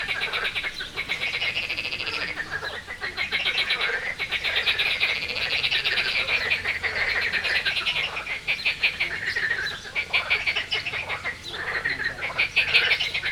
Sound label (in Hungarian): békakoncert, kecskebéka; jaszberenyi zoo 2015